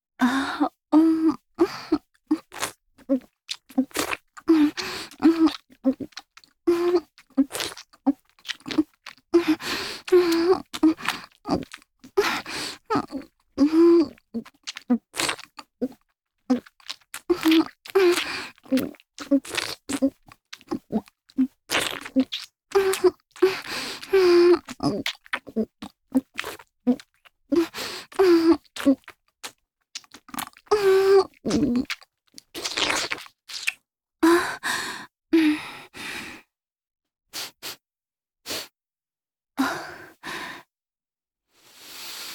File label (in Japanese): 亀頭をしゃぶる